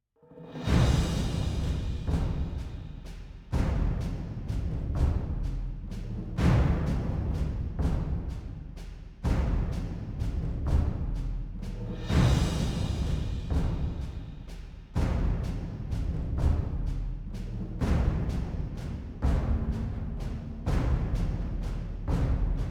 Stems; Planetary Annihilation

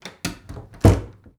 Door Wood Bedroom Close; The Looker

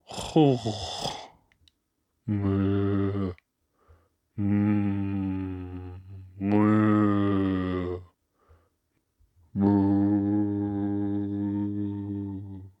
Caterpillar sleep loop

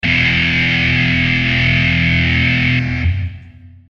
Lose Sound; santa rockstar

